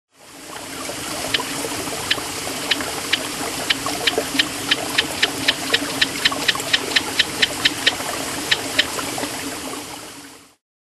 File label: Mantidactylus zipperi